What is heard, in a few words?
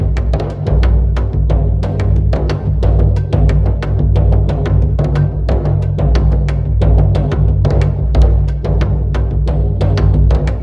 Boss Drum; Kidarian Adventures; Android, iOS, mobile gamerip